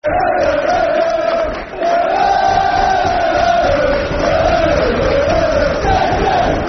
Der Der Hull City [Football Chants (Soccer Songs)]; fanchants; Hull City Hcfc